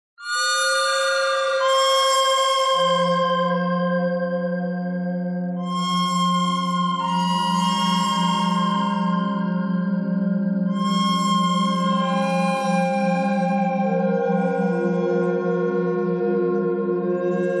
bird thread pentatonic; noreverb

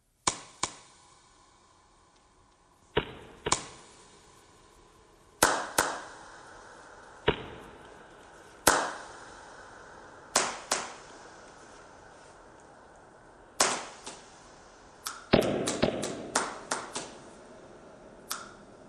clapping thread noise

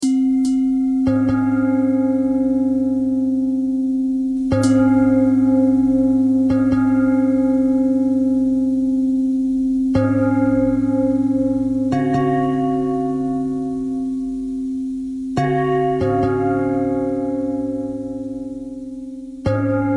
bell bell thread simple, bells

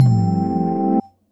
pinwheel; patatap

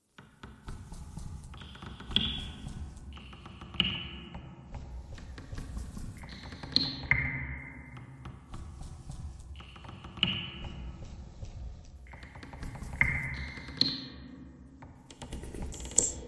bagpipe thread noise; reverb

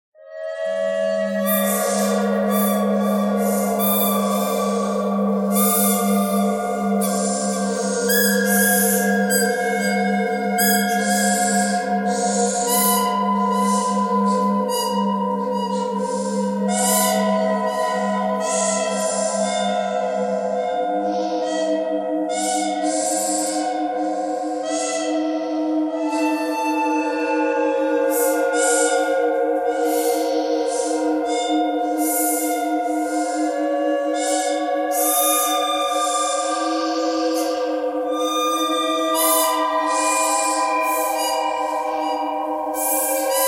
bird canyon bird thread noise all; birds

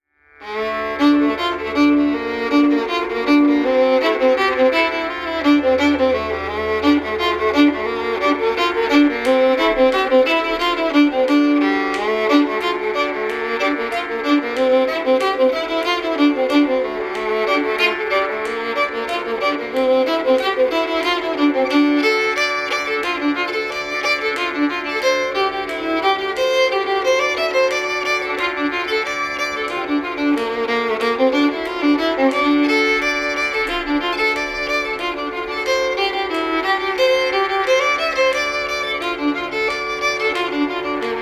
tam lin Basic and variation performance; fiddle

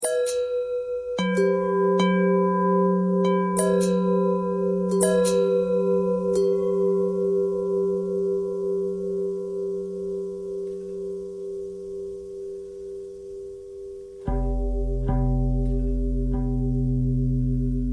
bell long bell thread pentatonic; bells

